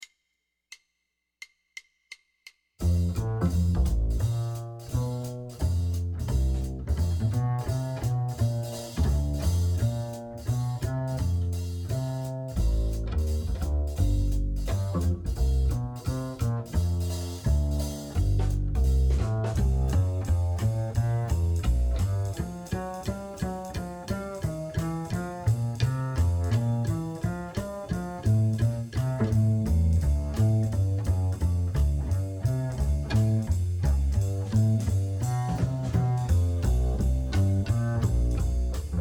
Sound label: Piano Guitar Vibes